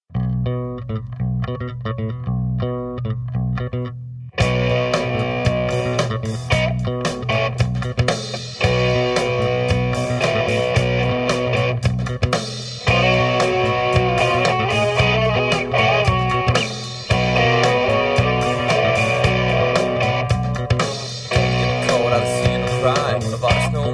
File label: filmrock